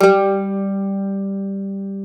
mandolin, Stereo Mandolin; E-MU Producer Series Vol. 2; More Studio Essentials; Composer

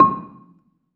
BELA C5, BELAFON, Asian Traditions; USB Soundscan vol.60; AKAI

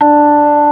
ORG. D4; B3 FULL

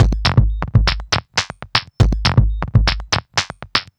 Modular Loop, Modular Percussion; Sonic Foundry (Sony Creative Software); Synchro Funk

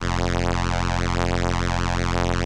ELKA, String Machines; Best Service; XX Large Most Wanted 2 String Machines

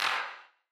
Wider Clap; stereo toolkit samples

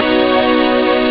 chord; Amiga Soundtracker Sample Packs

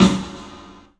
PA DEEP L, CLUB SN VRB; 3D Sound; 3D Drums

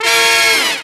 Brass Fall; Proteus 2000; Digital Sound Factory Proteus 2000

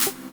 CLAPS HOU; Best Service Dance Mega Drums

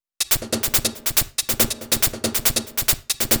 Hh Loop, Drum Loops 140bpm; Zero-G Trance Inducer

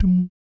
BASS DUM; Spectrasonics; Vocal Planet; Jazz FX

